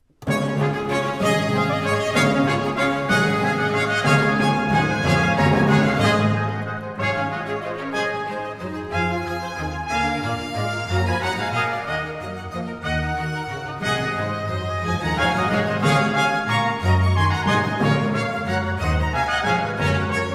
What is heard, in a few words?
chorus gloria in excelsis deo; mass in b minor bwv 232